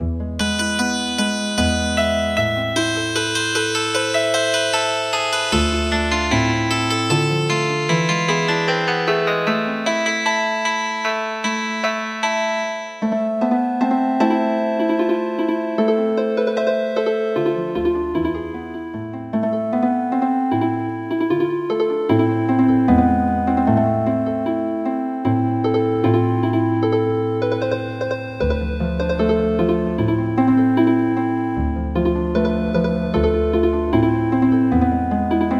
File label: Healin Harp